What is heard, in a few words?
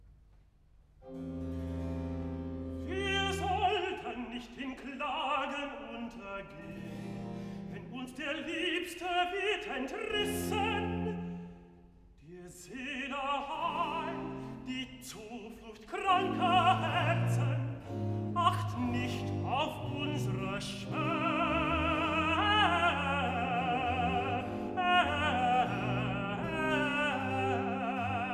Recitativo (Tenor); Cantata, BWV 103 'Ihr werdet weinen und heulen'; Bach; Altenburg; 3rd Sun After Easter